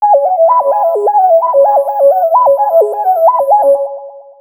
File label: Drowsy (Wobble); Sony Ericsson; PlusFourSix; Lena (W380i)